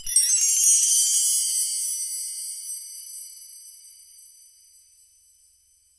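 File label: three short bright sparkle, synthesized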